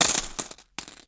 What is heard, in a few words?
coins bag